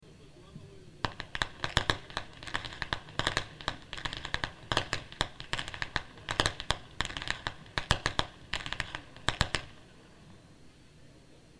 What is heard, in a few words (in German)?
schnalzen; surheim; saaldorf